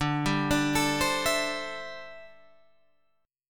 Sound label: D 7th Suspended 2nd Suspended 4th, Guitar Open G